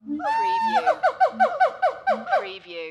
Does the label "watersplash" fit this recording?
No